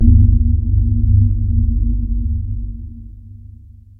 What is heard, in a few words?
Airy Pad; K5005